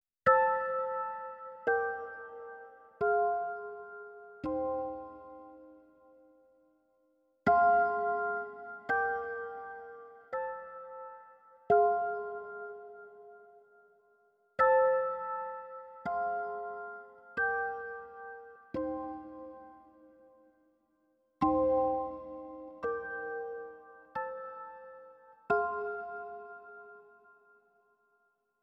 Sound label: big ben